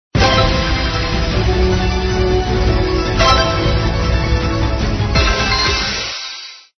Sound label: megawin